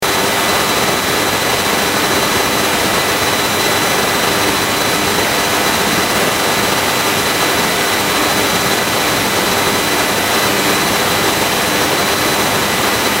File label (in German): LTE-Störgeräusch